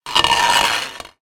metal pole small scrape tiled floor; zapsplat

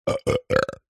human male burp short fast x3; zapsplat

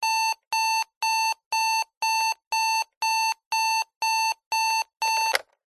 household alarm clock beeps then switch off

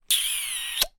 human kissing

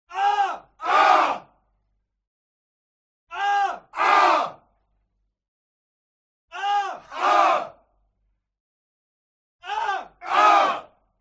HUMAN CROWD Military Shout Ah; smartsound

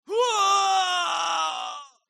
HUMAN VOCAL Male Scream Deep Pain; smartsound

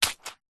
foley footstep single hard soggy wet grass mud

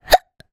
human child 4 years boy hiccup; zapsplat

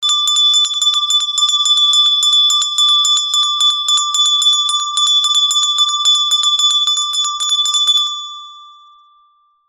bell med small school hand ring med

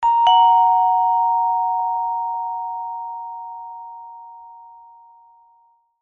household doorbell ring chime

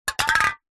industrial can wd40 drop fall over floor

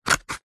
scissors single cut card; office

